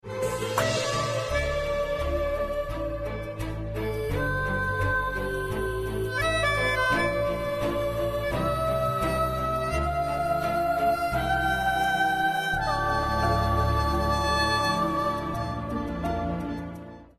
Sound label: instrumenatal bgm